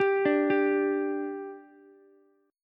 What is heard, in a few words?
G 5th G Ukulele, chord; G5 arpeggiated